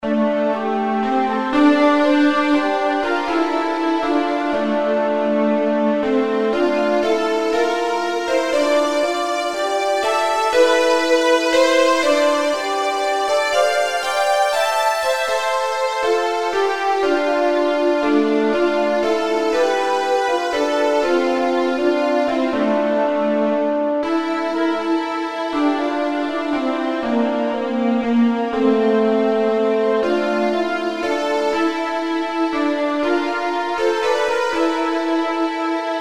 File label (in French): Valse gallo écossaise